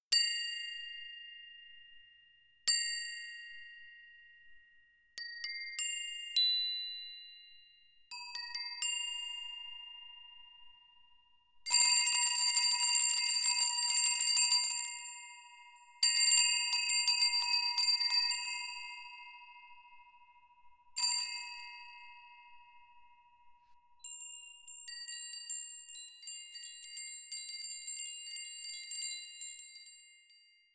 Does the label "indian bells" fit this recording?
Yes